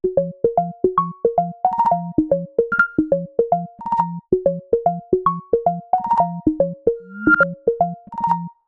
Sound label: Water Bounce, ringtones; Samsung Galaxy S26 Ultra